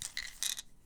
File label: Prescription Roll, Pill Bottles